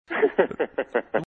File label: Laugh